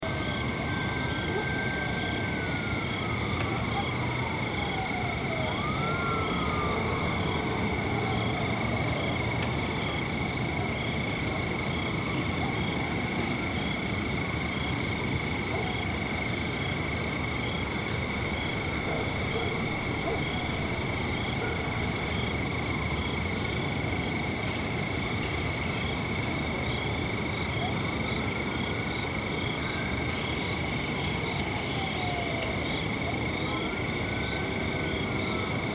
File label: Ambient; Flippin Kaktus